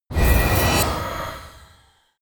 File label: magic spell float start; swooshes-swishes